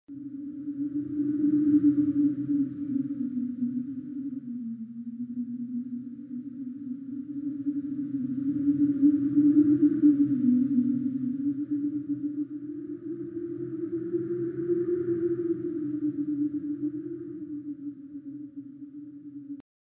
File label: wind howling